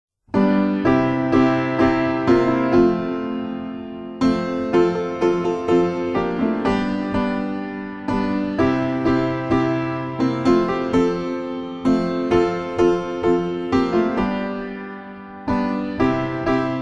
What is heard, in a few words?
Piano